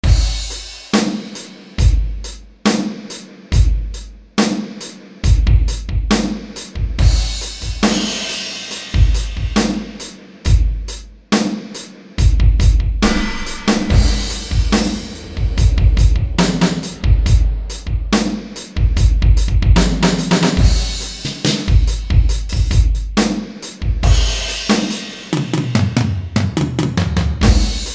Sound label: Ampitheater, E-mu Pro-Cussion; Factory ROM kits